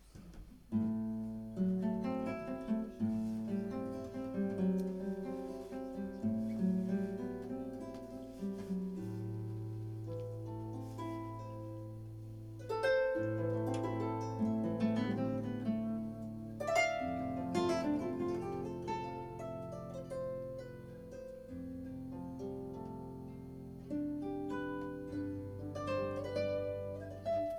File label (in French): Duo guitare et harpe; Ulysse